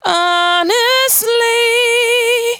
92bpm Bb, Velvet Vocal; Zero-G

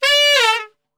D 3 SAX SHF, Sax; Giga Samples Collection

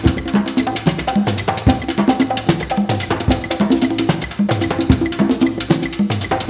LP, BREAKBEATS

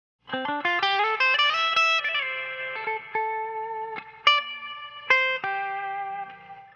Lead Guitar, African; Sonic Foundry (Sony Creative Software); Continental Drift Aftershocks

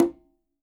QUINTO, Percussion

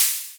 hat fat

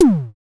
DSM2 Tom; MPC DSM-2

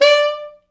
D4, Pop Brass; Best Service ProSamples vol.19; AKAI

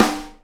SNARE RIM, Live Snares

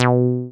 SYN 303, TB-303; TB303+MC202; Sound & Vision - Gigapack I CD 2

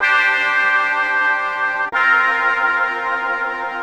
Rawstab C, Keys, Nu Jazz House, 125; PowerFX - Nu Jazz House

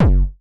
kick, Roland MC-909

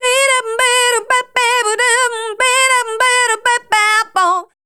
RHY LINE, Voice; Best Service; Voice Spectral II

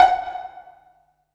PIZZ, Dance Mega Synth; Best Service; 90 s Sample CDs

